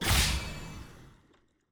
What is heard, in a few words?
UI Event Style Rank D; bloom minigame